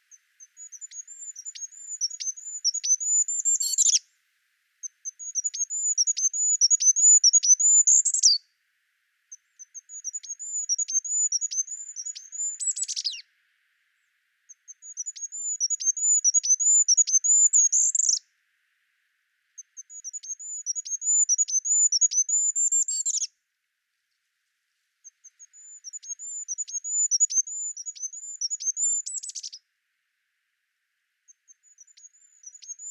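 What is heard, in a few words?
zeltogolovii korolek, goldcrest; ornitologiya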